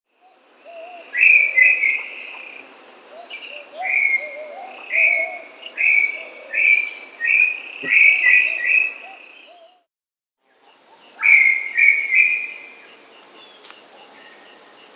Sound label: Brown Tinamou (Crypturellus Obsoletus), Andean Birds; S. America